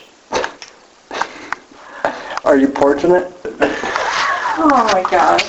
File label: talking then scream; Prospect Place; Prospect Place 2010; EVPS